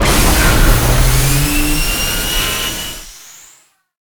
start, vehicles speeder; Quake 4 Alpha; id software

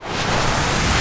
tank rail power up, strogg; Quake 4 Alpha; id software

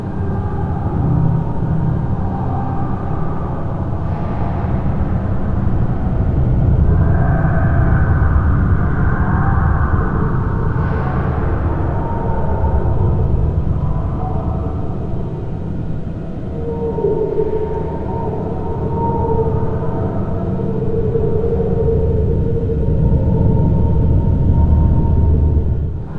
industrial pain loop; Quake 4 Alpha; id software

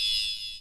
keypad negative; gui; Doom

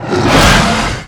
pinky attack melee, monsters vocalizations; DoomRTX

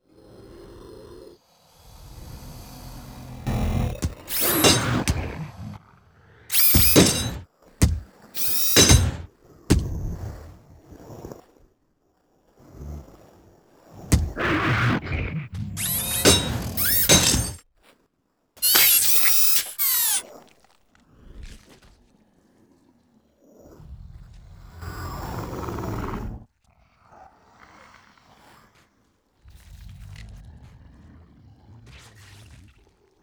bathroom LFE; Doom

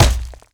Bullet hit body, bullet impact flesh; DoomRTX

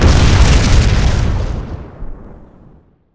space explo; Quake 4 Alpha; vehicles; strogg flyer

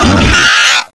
pain on fire, grunt; Quake 4 Alpha; id software; strogg

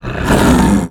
imp attack melee, monsters; DoomRTX; imp5

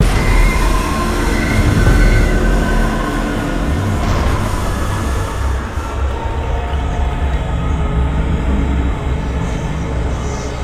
music; Quake 4 Alpha; subfloor cin

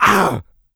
pain large, player; DoomRTX; medium

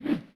fat woosh miss; DoomRTX

